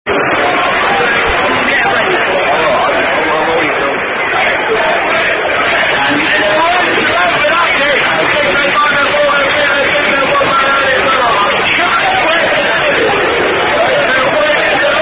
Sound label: Crazy Arsenal Fan Chant; 10 Men Went To Bed With Ashley Chant